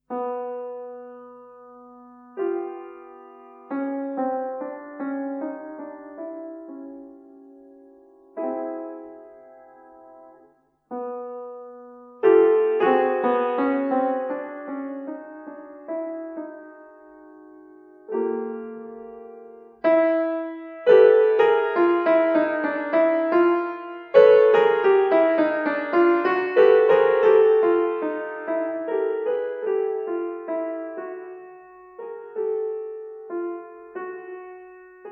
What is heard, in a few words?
solo piano music, Major Seconds; Юдина; Наследие Марии Юдиной; Bartok; Microcosm